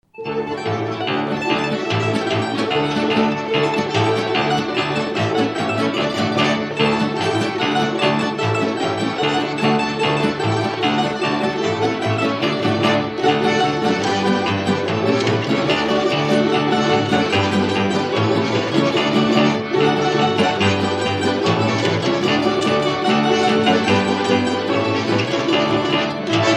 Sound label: Russian Balalaika